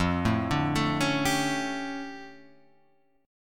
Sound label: D Minor Major 11th, F